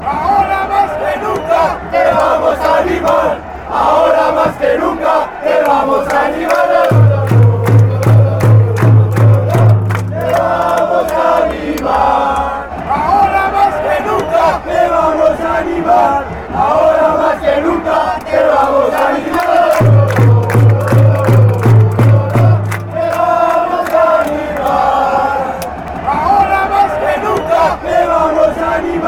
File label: now, chants